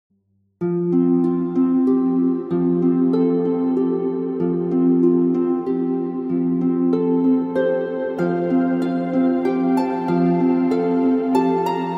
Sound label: christmas music heavenly harp christmas instrumentals relaxing fireplace; CANTARI